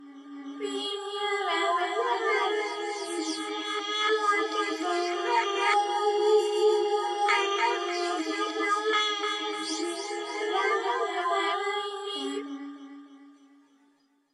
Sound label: Ring Around The Rosie